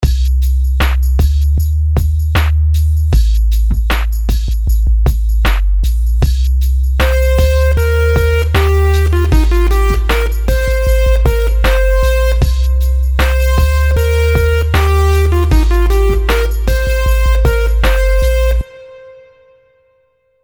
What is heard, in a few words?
melodies simple 16s Cmin; melody